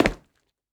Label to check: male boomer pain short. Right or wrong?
Wrong